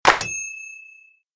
single clap

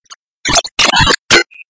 City Thunderstorm

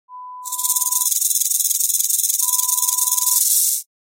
Rattle Snake

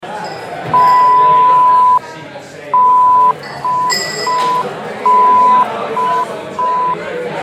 Party Atmos Med Hall Chink

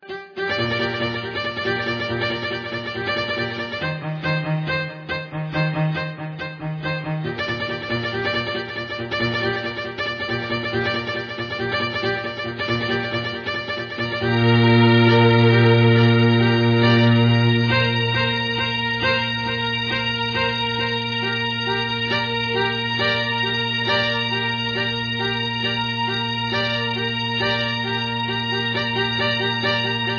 Classical Mood

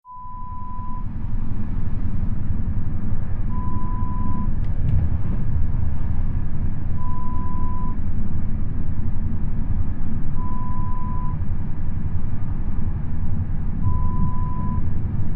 train internal sound

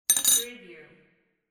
coffee spoon

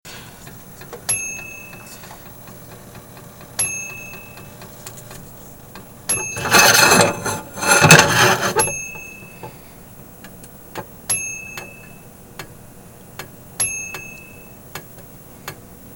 Frying An Egg in a Pan Sizzling

